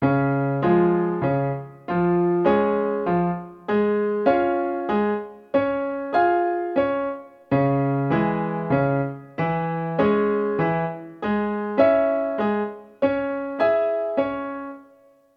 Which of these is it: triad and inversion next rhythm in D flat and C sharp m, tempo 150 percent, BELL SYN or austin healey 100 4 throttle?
triad and inversion next rhythm in D flat and C sharp m